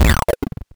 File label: screams robot